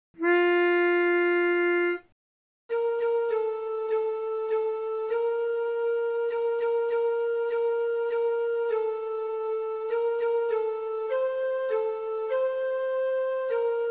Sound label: Tenor